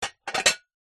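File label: household plate dishes place onto stack of others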